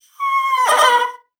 BASSOON; Xsample VOL-6

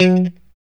POP F#3, STRAT guitar; Spectrasonics; Hans Zimmer Guitars VOL-1; JC-STRAT POP

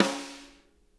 snare rim, drum kits

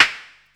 HI SNARE, synth perc; Zero-G; Ambient 1

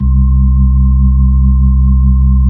B-3 Chorus

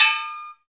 PAN, Misc Metals; Club-50 - Foundations Roland; CowBel+Metl

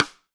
RIM MIX, AMBIENCE RIM

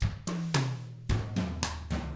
COUNTRY, Drums; ILIO; Double Platinum Drums 1

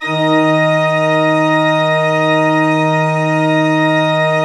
D2, Cathedral Organ

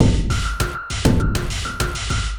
drloop, drumloops, Vinyl Attack; Masterbits

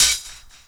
HH, Dance Drums

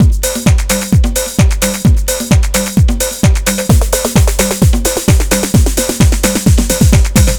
DRM, LOOPS 130, House; Best Service ProSamples vol.10 - House; AKAI

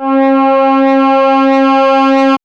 MOOG; AMG - Vince Clarke Lucky Bastard

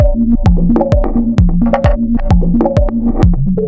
Corals 130bpm, House Drumloops; Zero-G House Fabrik Drumloops; ZG Drumloops

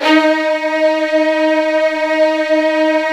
Virtuoso Strings

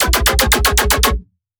Fmin7 Bass

